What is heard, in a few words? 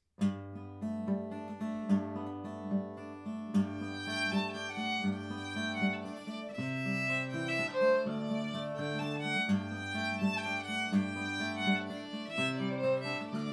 Hancock Waltz harmony